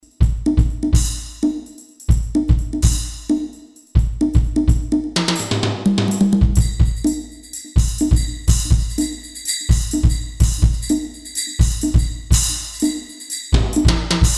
Alesis Turbo Mesh Kit Perc Kit

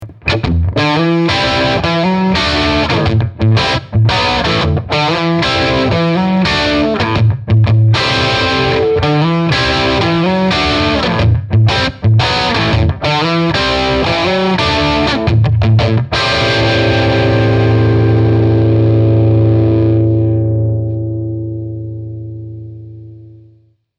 VOX Cambridge 50 Marshall 1959 Modeling

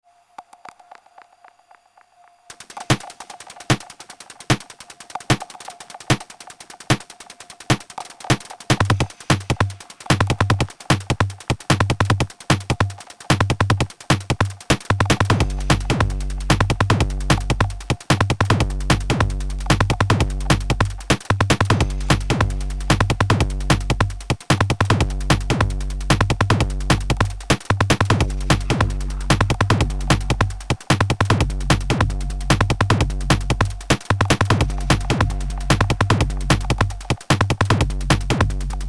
Erica Synths LXR 02 Drive Routing; Sonic Potions

